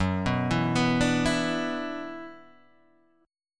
F sharp 7th Suspended 4th Sharp 5th, down, Guitar, Open D